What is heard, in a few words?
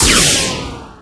synth laser; Earth and Beyond; EA Games